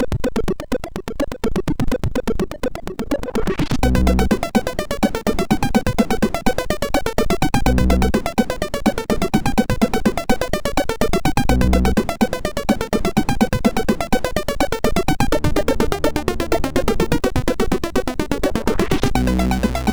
Digital World tune, MOS6581R4; Digital World tune 3; Daf

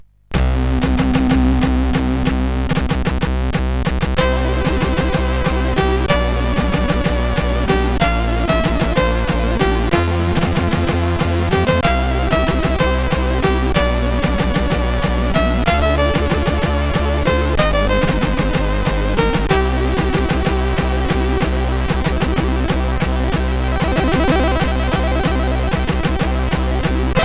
Metal Warrior 2, CSG8580R5

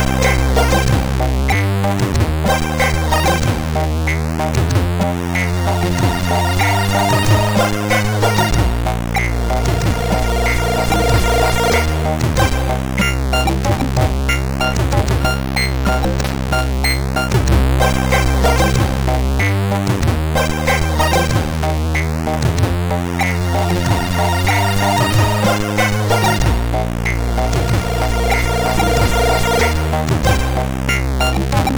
Old Music, CSG8580R5

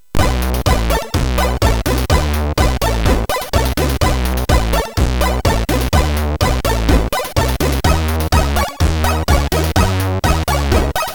sid, MOS6581R3; Vertical Smiley

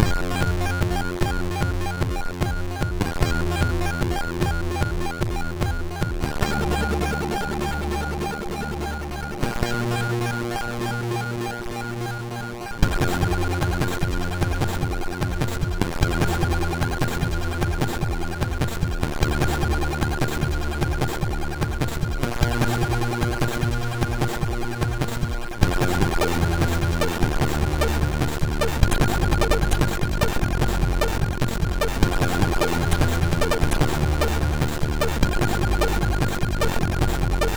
sid MOS6581R2

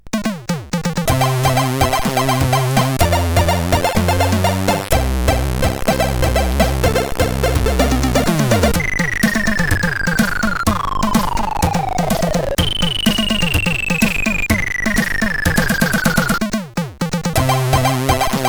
Intro samba, sid CSG8580R5

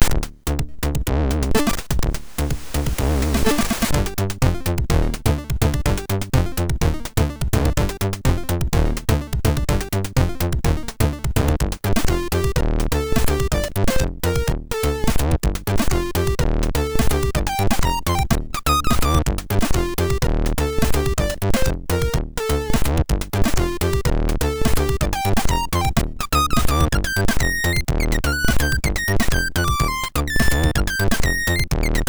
Jazzgo, MOS6581R3

